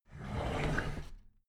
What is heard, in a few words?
drawer big squeak open regular